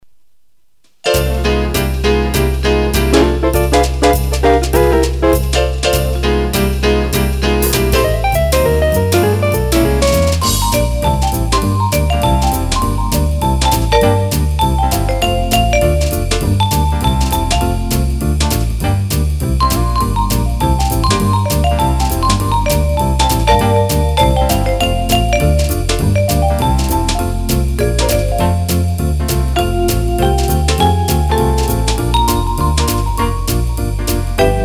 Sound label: Swing